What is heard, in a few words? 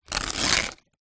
paper bag small rip; foley